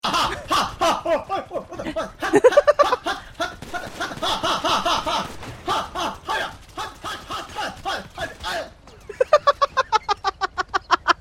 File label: hahaha